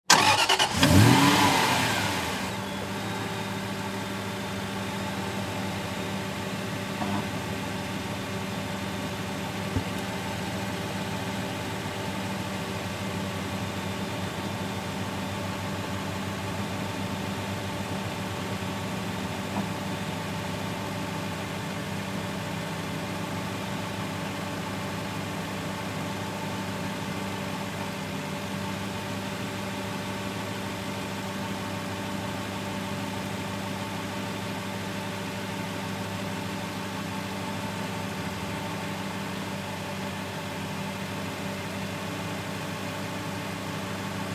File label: Vehicle Auto Aston Martin Start Up Idle Shut Off Automobile Car; Car 2008; Cars